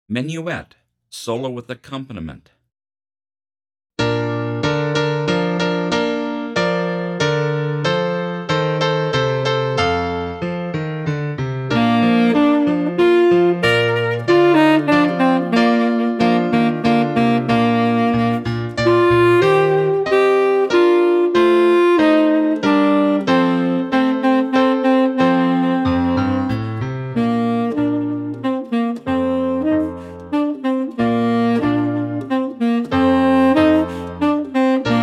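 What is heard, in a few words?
Menuett Tenor Full, Tenor Sax; 20pct Slower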